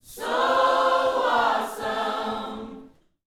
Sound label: Vocal, Gospel, PHRASE; Spectrasonics